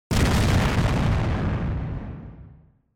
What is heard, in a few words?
Explosion, Short Close